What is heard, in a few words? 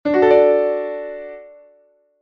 piano